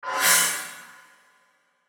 Whoosh Scrape Metallic Fast; Blastwave

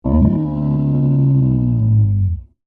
Dinosaur Groan, Creature; Animal; Dinosaurs; Animals; BlastWave

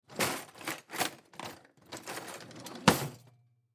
Silverware Rummage Drawer Close; Household; Dishware

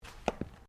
FOOTSTEPS THONGS (FLIPFLOPS) CARPET STEP; Footstep Foley; Human

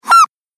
Squeaky Dog Chew Toy; Toy Animal Sound Effects; Foley Sound Effect; Andrew Fleming

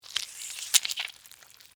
Squish Orange Rip, In, Half, Fruit; Texture; Squishes; BlastWave

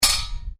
METAL STRIKE; Materials

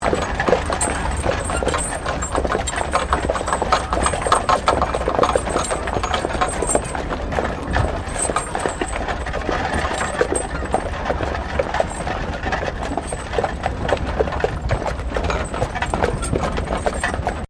Wagon Horsedrawn 4 Horse Medium Loop; Carriages; Vehicles

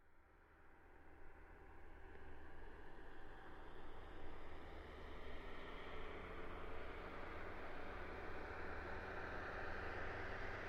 Car Smart Car By Med-Fast; 2005 Smartcar Fortwo; Vehicles; Big Room Sound

